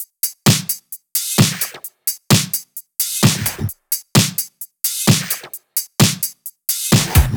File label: 130BPM Jig Kit; Vengeance Samples; DK Jig Kit